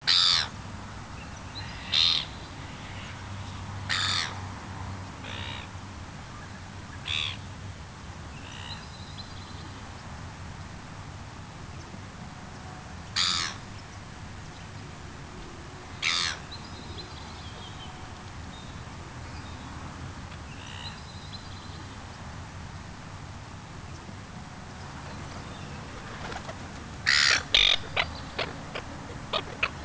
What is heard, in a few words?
Aus Seagulls